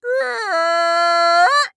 Sports Human Animal Call

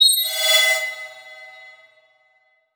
Transition Bell Swell; Adobe; Production Element

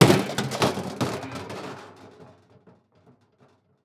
Impact Metal Object Dropped On Cement; Impacts

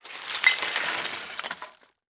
Crash Glass And Wood Debris Searching Through; Crashes

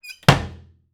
Household Cabinet Close

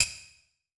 Click Mechanical; Multimedia Internet CD-Rom Flash

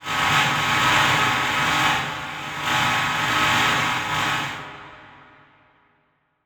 Transition Distortion Power Source; Production Element Title Transition

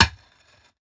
Weapon Axe Hit; AdobeCC

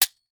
Foley Toy Gun Handgun Firing

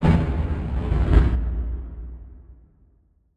Transition Blast Distortion; Adobe; Production Element

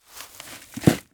Industry Dirt Scraped Into Bucket

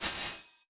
Transition Feedback Ring; Adobe; Production Element

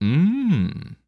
Human Male Mmm

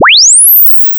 sine sweep linear

